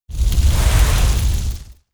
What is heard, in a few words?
Magic